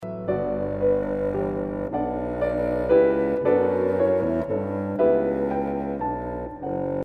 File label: Waltz, Big Bassoon